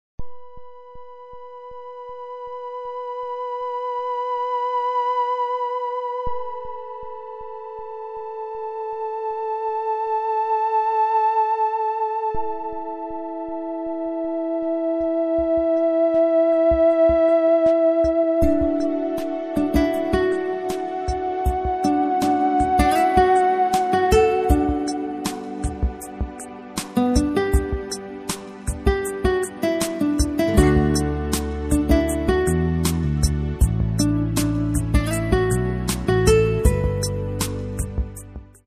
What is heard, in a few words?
sounds of guitar